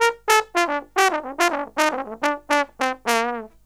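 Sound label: trombone, Killer Brass Riffs; USB Soundscan vol.29 - Killer Brass Riffs; AKAI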